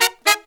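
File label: Pop & Funk Brass, 110 Dbm, right; Best Service ProSamples vol.25; AKAI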